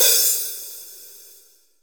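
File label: 14 HH MED, hi-hat; AMB KIT; Real Mega Drums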